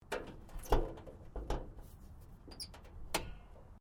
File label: Car Toyota Yaris Engine Bonnet Open; Toyota hire car; Cars; Vehicles